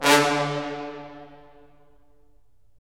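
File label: brass cimb, Roland; Roland LA Composer vol. 4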